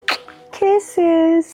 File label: kisses